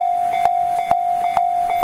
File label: crossing; tetsuon